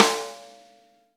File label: V-Pop Snare, Roland BK-5; Snares